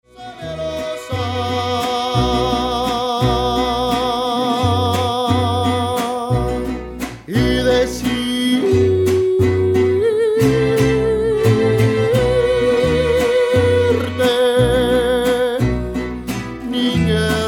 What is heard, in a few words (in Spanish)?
mariachi, la malagueña